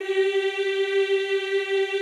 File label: EEH G3, Extended Classical Choir; Best Service